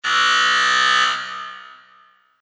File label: Sports Buzzer